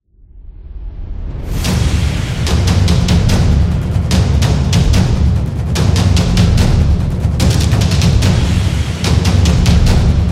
Japan Taiko; tribe

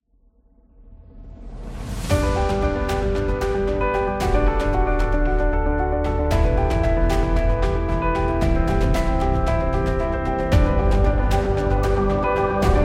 Motivational Inspiring; motivational speech

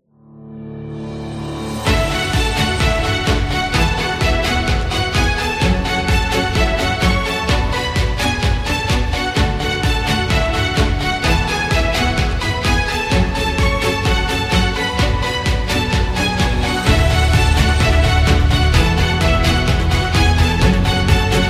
Motivating Dynamic; waiting music

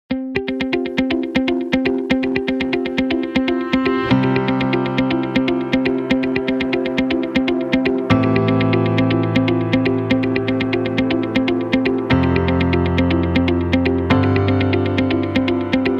Minimal Corporate; corporate success

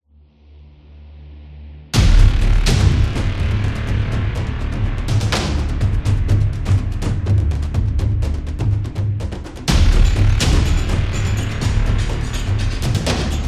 Battle, epic drums

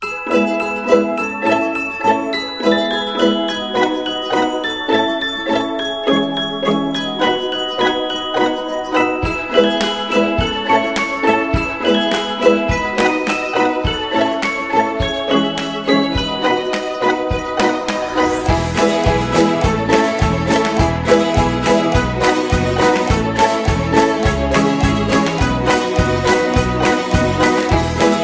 Christmas Fun; snow

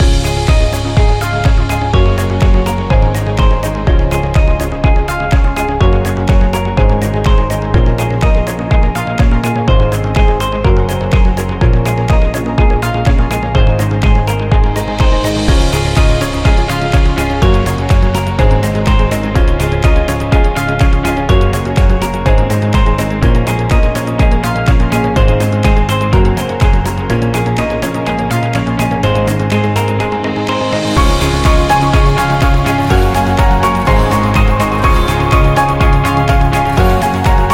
Motivation Loop Corporate

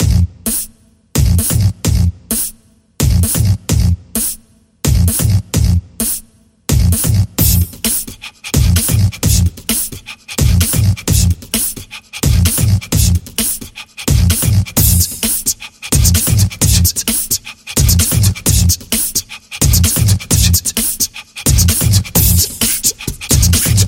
Upbeat Beatbox

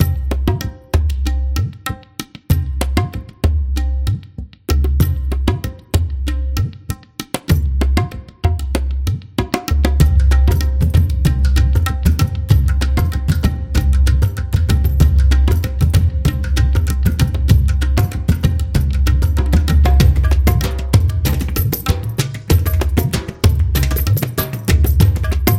India Drums; tribe

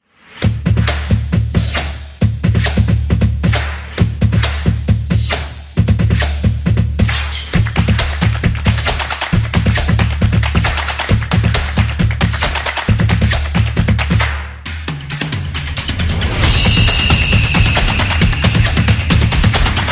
Sport Upbeat, sport drums

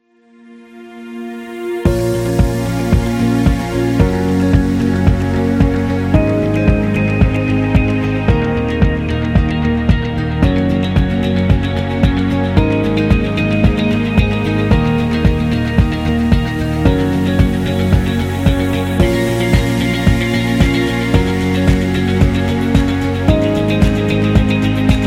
Science Background; healthcare

